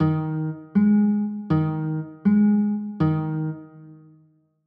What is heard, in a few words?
a flat 6